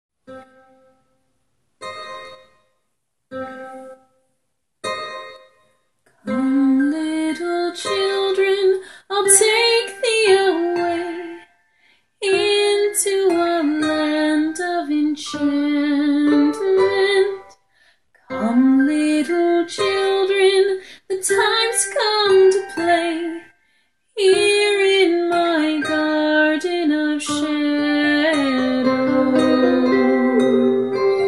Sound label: Halloween song